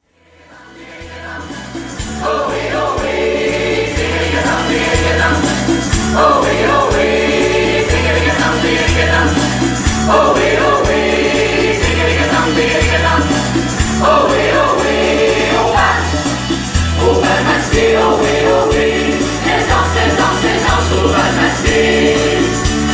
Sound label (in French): SATB Mi mineur